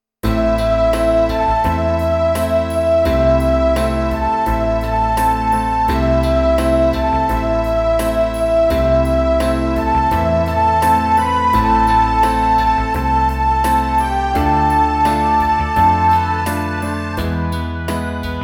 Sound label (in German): New Melody Streicher, Qurerflöte